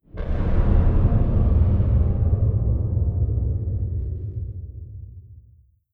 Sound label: distance extra dimensional ship death; ambient; Stellaris